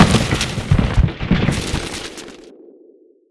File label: bucket drop; weapons; dota; creep; roshan